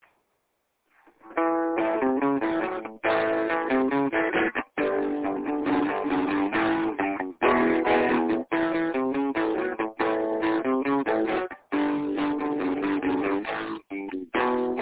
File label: revvin up southern style